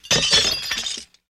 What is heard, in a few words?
Dish Glass Break Deb; dishes